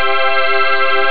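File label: melo major; jazz jackrabbit 1; M8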